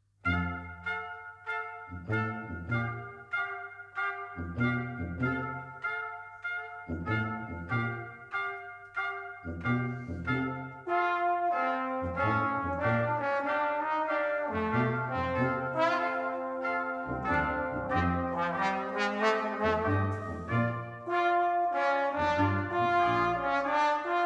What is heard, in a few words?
Blues